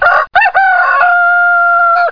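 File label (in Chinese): gongji jiaosheng, rooster crowing; jiachujiaosheng; jiaqing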